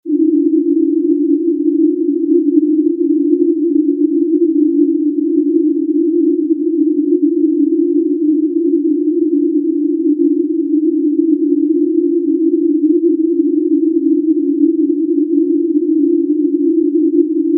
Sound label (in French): bruit rose